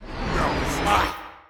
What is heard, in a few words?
Punch Left Speed Up